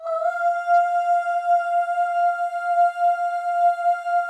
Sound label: OH, FEM, Symphony of Voices; Spectrasonics